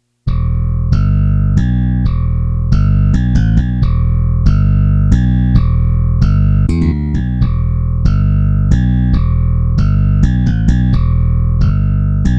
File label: bass